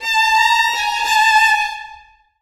spitter bacterias, music; Left 4 Dead 2; Source Engine

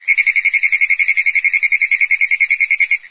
frog long, frog groups; ambient; random animals; Left 4 Dead 2; Source Engine

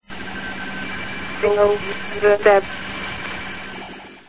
citadel ambience; video game; Source Engine; beta citadel ambience

